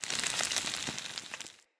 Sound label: Impact Burn Medium Flesh; Weapon Impacts